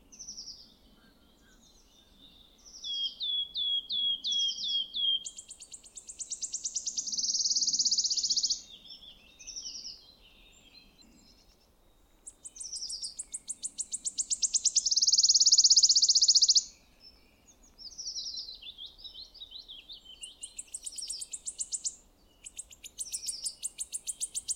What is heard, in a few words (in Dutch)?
fluiter zang